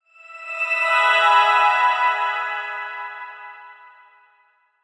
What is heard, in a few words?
Shimmer Descend Melody Reverb Wash; Descending; Blastwave